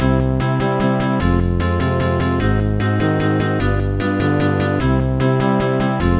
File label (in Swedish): ackord; MusicLine